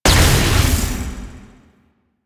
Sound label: Wave Ability Proj By Explosion; Enemies; Abilities; Warframe; Duviri; Dax Knight